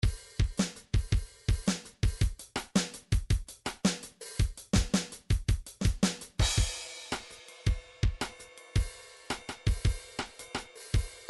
bigband; sonar

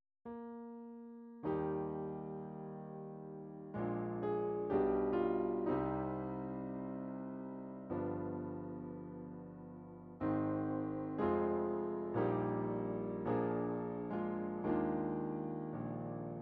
chopin piano solo, midi; largo

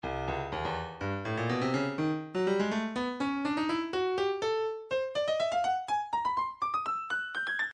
breakbeat midi, 123 bpm; Stylus Breakbeat Midi Files

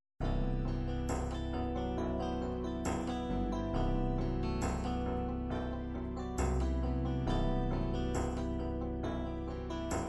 ballads ballad slow ballad, midi

